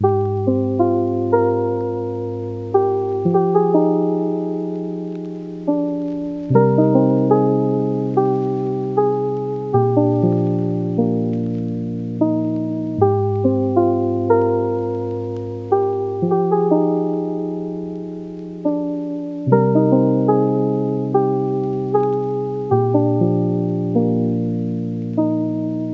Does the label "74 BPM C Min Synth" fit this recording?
Yes